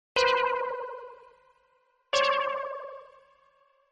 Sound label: awkward pause